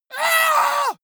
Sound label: monster growl attack high; schreie